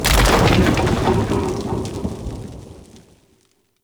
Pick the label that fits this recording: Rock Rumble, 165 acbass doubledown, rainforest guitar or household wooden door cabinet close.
Rock Rumble